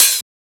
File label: Open Hat; FLPRO